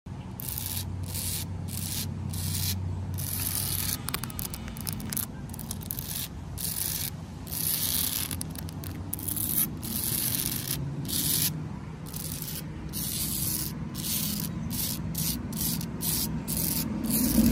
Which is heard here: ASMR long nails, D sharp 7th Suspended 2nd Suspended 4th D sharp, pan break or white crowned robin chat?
ASMR long nails